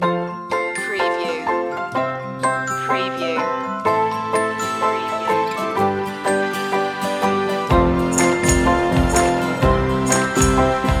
instrumental

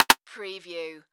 Multimedia Percussion

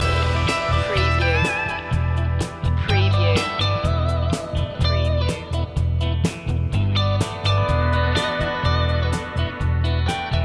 dark country loop

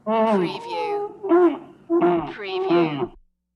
Rhinoceros Whines Vintage

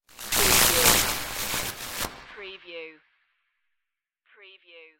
electric space glitch

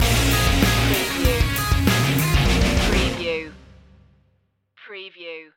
crunch time stinger

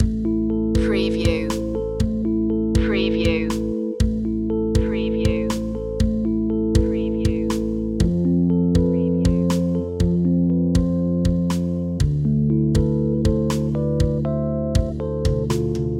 loop

